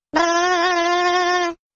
funny vibrate

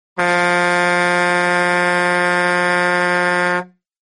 truck horn sound

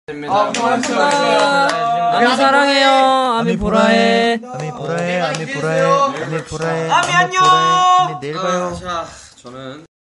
fan chant song

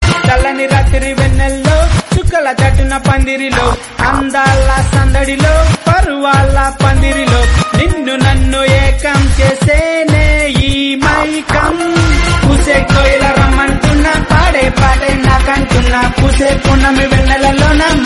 telugu song

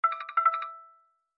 message tone; poco m2

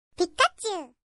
pika pi; pikachu

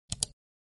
mouse click sound effect

